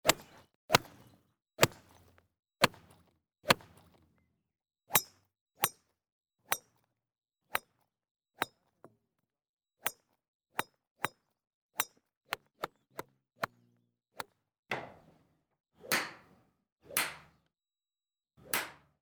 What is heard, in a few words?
golf ball being hit